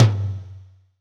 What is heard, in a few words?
R-8 dry tom; EdgeSounds; Drum Mashines; R-8 DRUMS